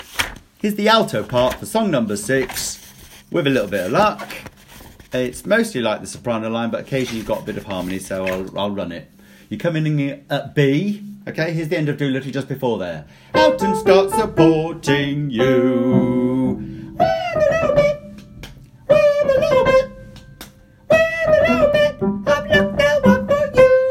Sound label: Alto part